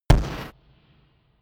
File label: gun pop gun shot; schusswaffen